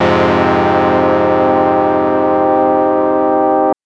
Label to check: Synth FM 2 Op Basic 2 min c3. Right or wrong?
Right